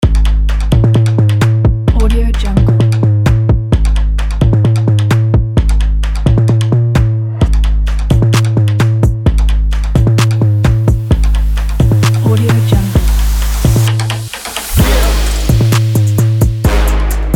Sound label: sport trap